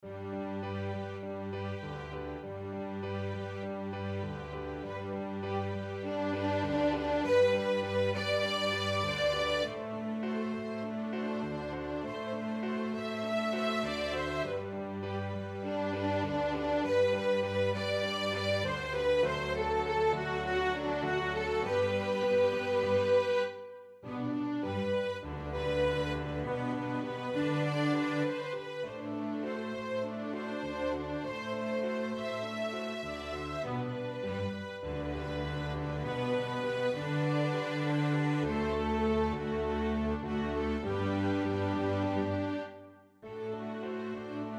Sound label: Strings Raging Rumba